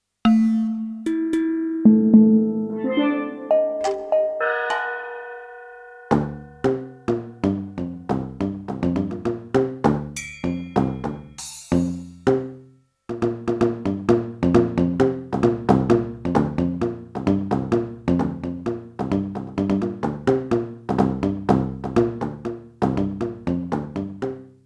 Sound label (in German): KORG MPS10 PolyPipe umgeschaltet